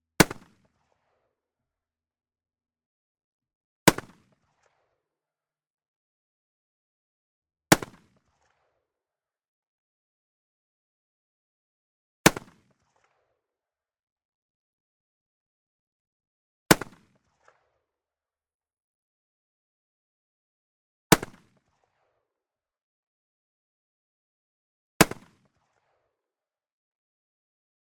K98k, Firing, Mauser Karabiner 98 kurz K98k bolt-action rifle; MKH416; Pole Position